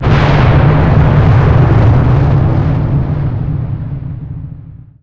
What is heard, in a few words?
Ball Explo; Phoenix